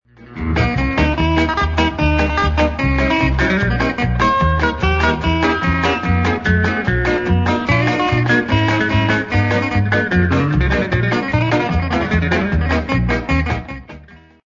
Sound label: Guitar Happy